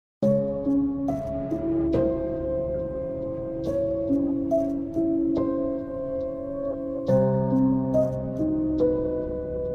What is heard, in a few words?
scary tiktok music